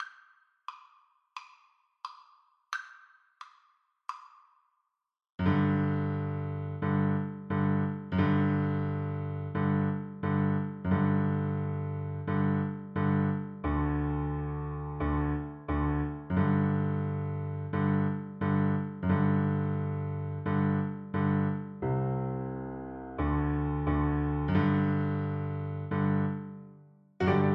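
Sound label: recorder karaoke; black bear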